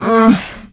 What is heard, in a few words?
jump; quake2; fbetch